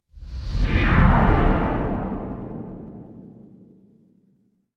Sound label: magic scifi teleport